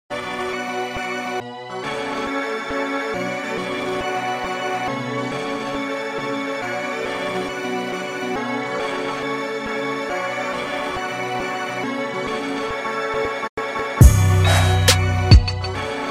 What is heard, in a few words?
drum kit; yung city slicka; pints and gas